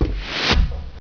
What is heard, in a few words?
door move